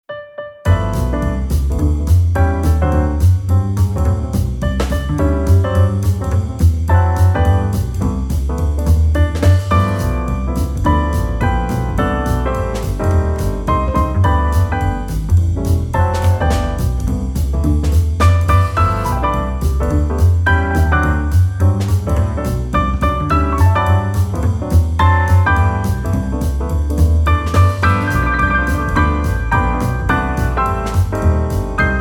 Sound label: happy birthday jazz trio